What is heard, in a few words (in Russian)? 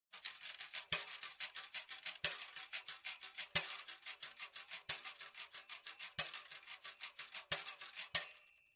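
ритм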